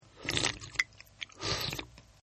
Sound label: Slurp, Drinking; Human